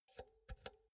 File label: insert cinch slow